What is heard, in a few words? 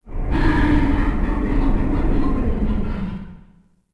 Motor Sucky; Ambient; Sid Meier's Civilization 4 Complete; Beyond the Sword